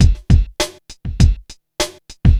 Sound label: drloop, drumloops, Vinyl Attack; Masterbits